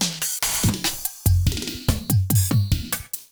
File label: DnB Variations 144